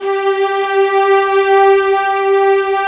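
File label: strings vox G4; VOXSTRING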